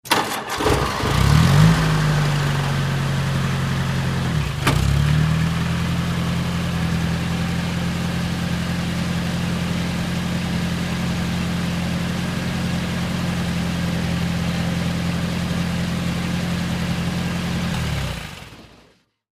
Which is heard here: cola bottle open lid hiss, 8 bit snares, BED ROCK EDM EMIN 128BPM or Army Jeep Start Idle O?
Army Jeep Start Idle O